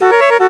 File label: horn; TrackMania United; Skins